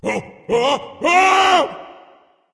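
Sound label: non verbal fear, voice; cernunnos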